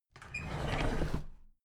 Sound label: drawer big squeak open heavy